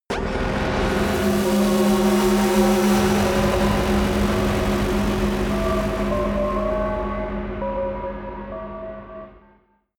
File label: buzz crying bells high; musikelemente; drones